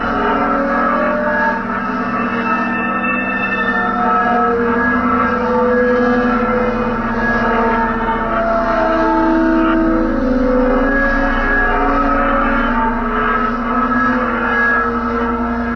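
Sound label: outside lighthouse ambience; hl2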